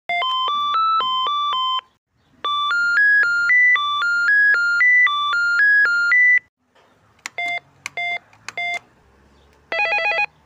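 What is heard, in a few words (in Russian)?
звуки домофона; eltis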